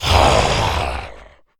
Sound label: zombie attack; DeadFright